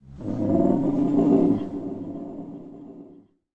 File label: howl; The Elder Scrolls III - Morrowind